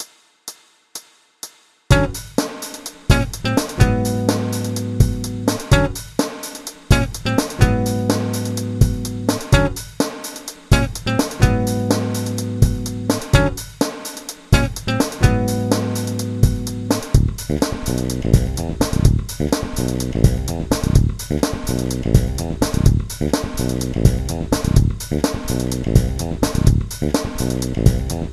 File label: acid jazz